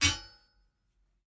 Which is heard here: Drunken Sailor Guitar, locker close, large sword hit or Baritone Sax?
large sword hit